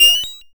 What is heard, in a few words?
Pickup Coin